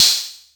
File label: Jomox Hat